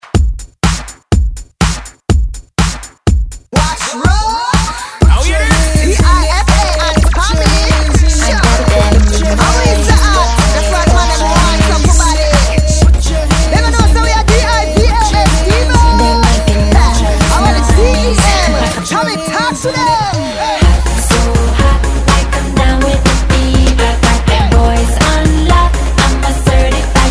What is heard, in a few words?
122 Bpm; DJDOLLS